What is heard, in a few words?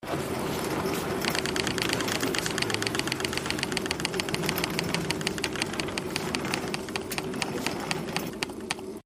bonus wheel click; Wild Ape